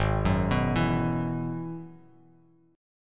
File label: G sharp Minor 7th Sharp 5th G sharp down Bass Standard; G#m7#5 arpeggiated; JGuitar